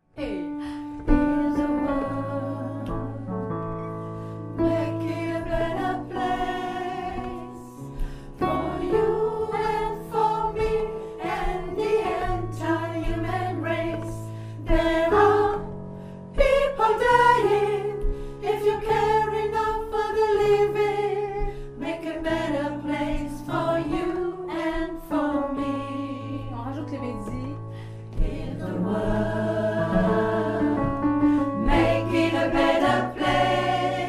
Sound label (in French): refrain soprano; gospel